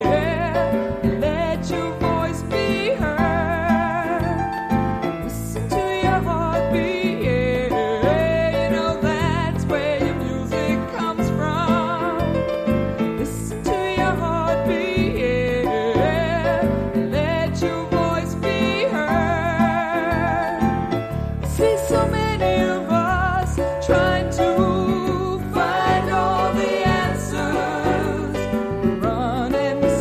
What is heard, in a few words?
Heartbeat